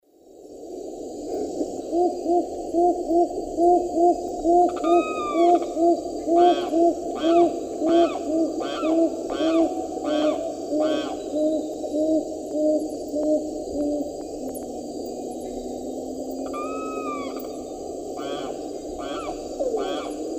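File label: Tigrisoma lineatum, song, birds; S. America; Eastern Lowlands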